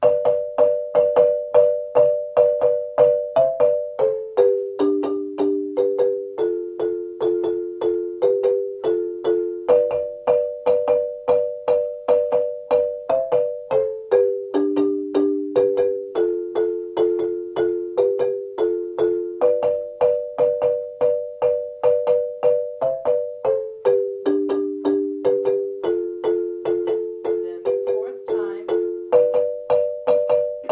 lead response chords, marimba; kare mugomba

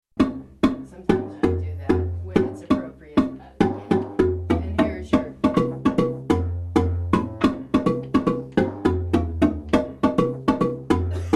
bass, marimba; babamudiki; Bass -- Larkin

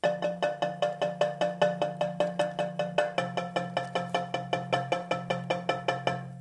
transition, marimba

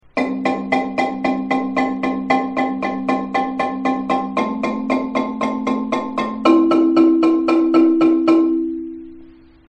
marimba, layering end

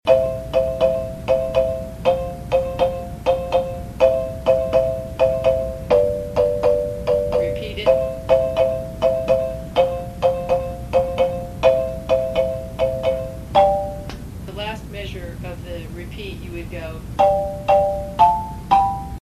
lead chordal low, marimba

